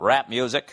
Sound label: rap music